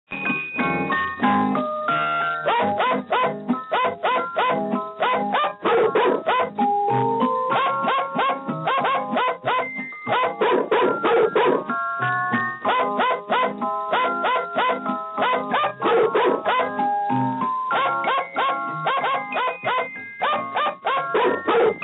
Jingle Bell Bark

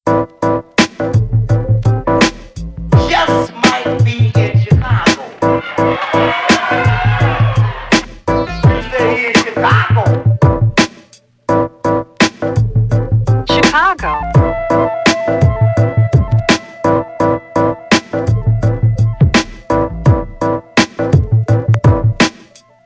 Chicago Break

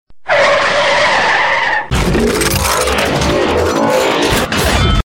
Barricade Crash

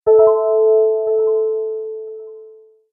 Washed Out Notes

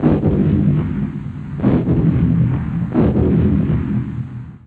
Battle; Quake 2 Battle

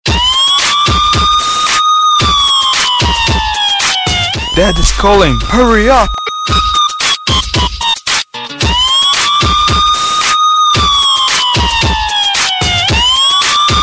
Siren

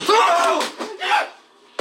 Scream

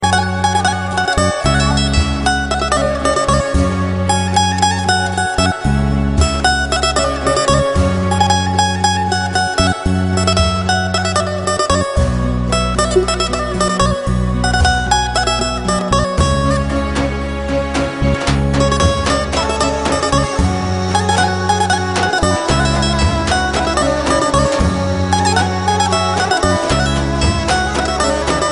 Albanian Guitar

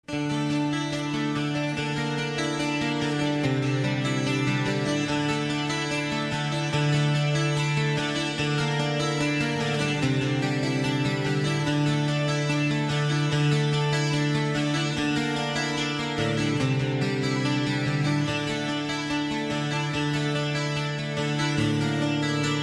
Cool Guitar Ringtone

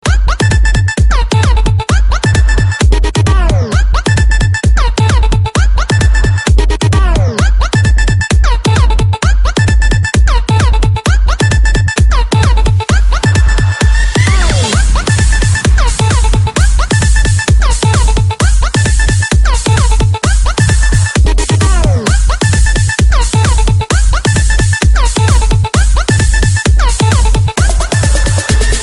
3d Beat Refresh

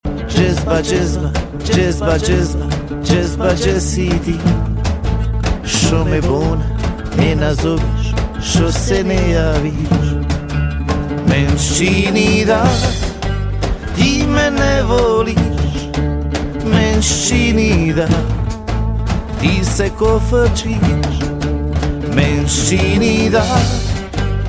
Jazz

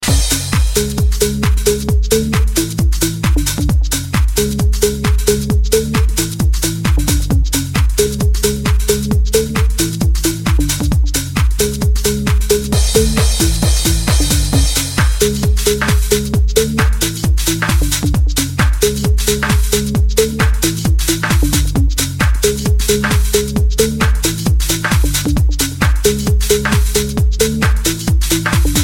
Get Funky Drop

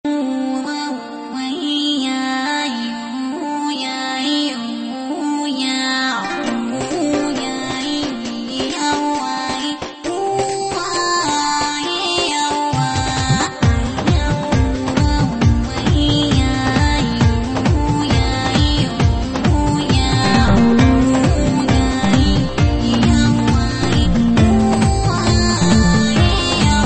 Telugu Ringtone

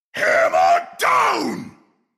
Hammer DOWN

